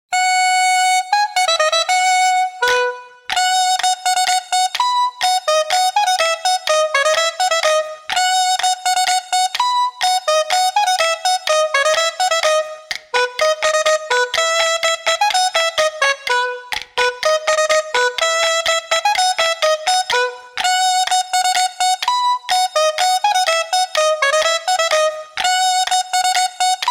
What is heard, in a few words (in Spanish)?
Al Son de Campanas dulzaina